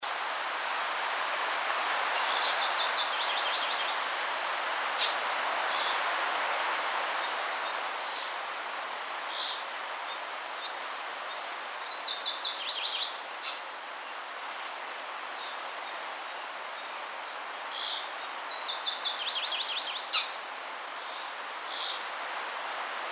Amakihi Kauai

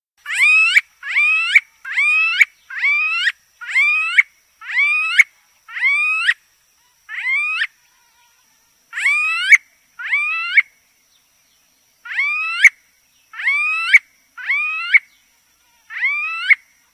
Smooth billed Ani

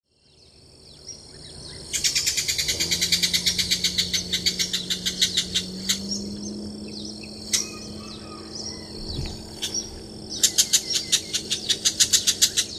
Brown Shrike

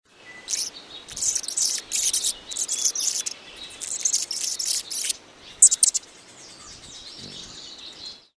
Annas Hummingbird

